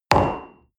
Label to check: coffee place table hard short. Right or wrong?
Right